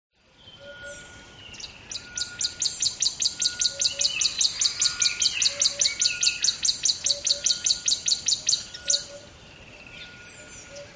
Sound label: ATMO BUSH River Dawn Bird Close; smartsound